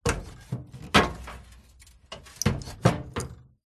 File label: household bbq metal lid movements bangs